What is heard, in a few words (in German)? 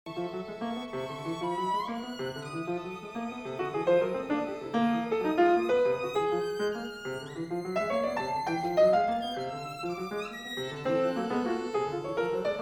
Ligeti Horntrio